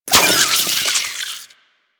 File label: Water Sprayer Last Shot; Warframe